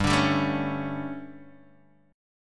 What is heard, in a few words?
D Major 7th Suspended 4th, guitar chord